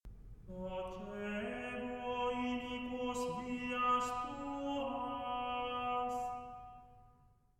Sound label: Gregorian Chant; Miserere; Psalm 50; Docebo iniquos vias tuas